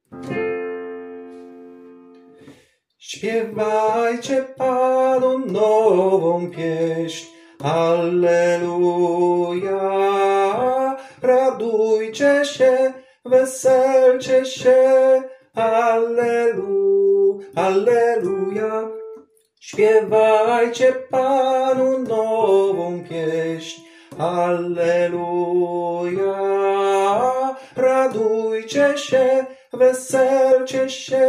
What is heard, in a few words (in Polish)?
tenor; spiewjcie Panu nowa piesn; nagrania glosow warsztaty2026